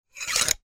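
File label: long swipe; Звук ножа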